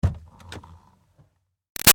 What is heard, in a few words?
Car Highlander Door Interior Open; 2005 Toyota Highlander V6; Cars; Vehicles